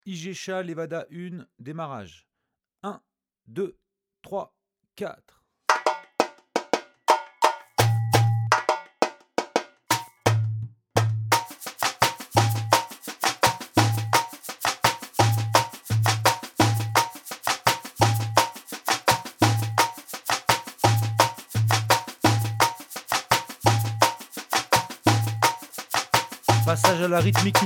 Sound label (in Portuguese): ijexa level 1 no surdo 1 2